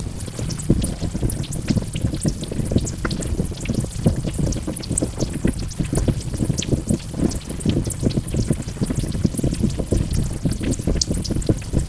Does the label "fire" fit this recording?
Yes